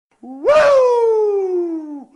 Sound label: Wooo; Ric Flair